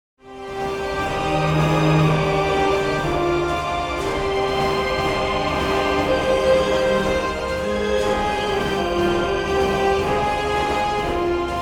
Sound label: combat music; Battle Themes; Soulslike; Elden Ring style